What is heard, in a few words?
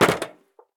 landing proflist; Escape from Tarkov